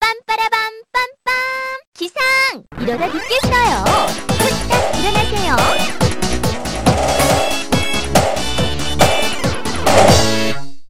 3D WakeUp, ringtones; SKY IM-A770K-VEGA-Racer

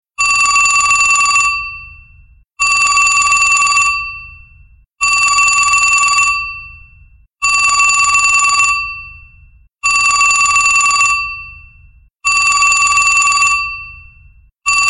Vintage Telephone, ringtones; LG SU760 Optimus 3D

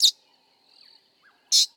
Meyers parrot at dawn; Google; Google Sounds; Sound Matters

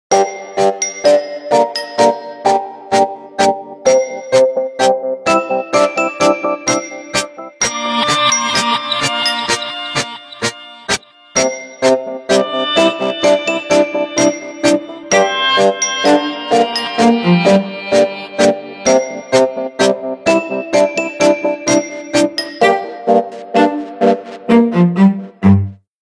Bouncey, ringtones; Sony Ericsson W705

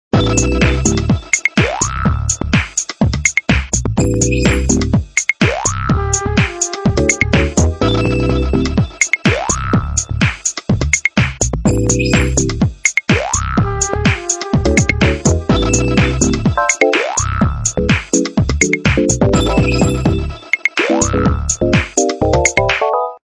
Bee, LG9600 Versa

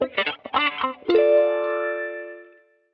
Guitar

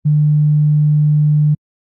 Vibration Call; Samsung; Galaxy A52s

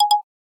drumset zero; SKY IM-A850S-VEGA-R3; keytone

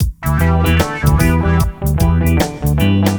reagge, ringtones; Google; NewWave Labs

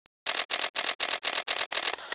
Shutter multi, Cam; LG GD880 Mini

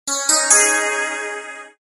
Strat Arp, phonetones; Alcatel OT-808

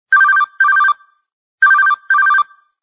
Ring; Simple

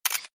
camera click

